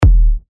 Kick D; techno; Armageddon

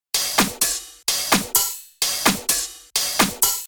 Top Loop (128 BPM)

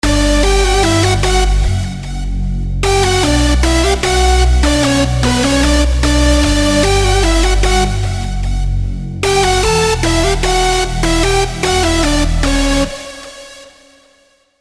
Hardstyle Melodies B WET (150 BPM)

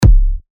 Kick C#; techno; Fauna

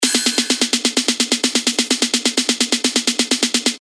128 BPM

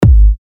Kick G#; TECHNO

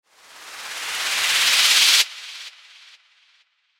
Uplifter

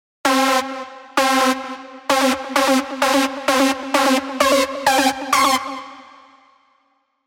Big Room Hammer; Spire

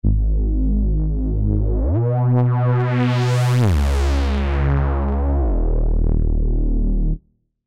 Reese Aggro

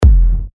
Kick F; techno; Warcraft

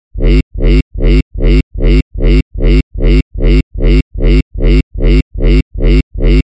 Reverse Bass Loop G# (150 BPM)

